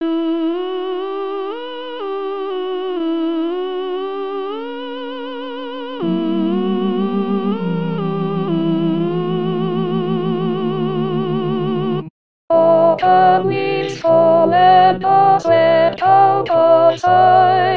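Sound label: Soprano 2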